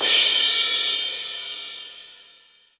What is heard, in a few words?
crash, Yamaha TG-500